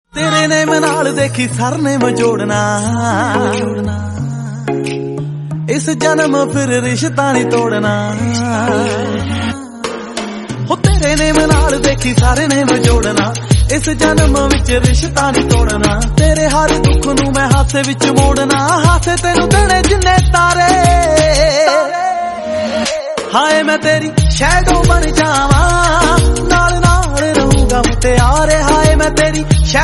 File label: punjabi song